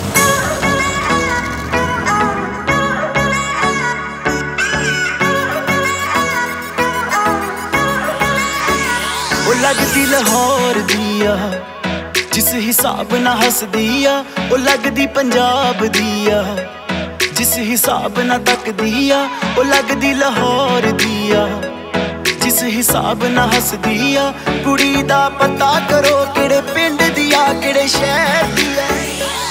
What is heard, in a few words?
Punjabi Song